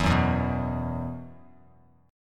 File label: E Suspended 2nd E, down, Bass Standard; Esus2 strummed